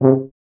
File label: BRS TUBA, Solo Instruments